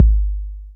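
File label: BD, bass drum; X-Static Goldmine 1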